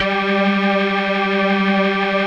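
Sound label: dance organ G3; Best Service; Advanced Media Trax Modern Composer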